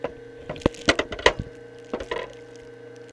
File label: Drive Clicks; Cumbria Remixed Sample Pack